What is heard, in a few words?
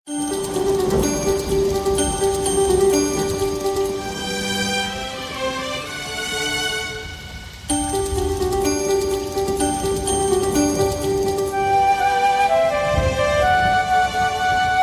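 Instrumental Music Ringtone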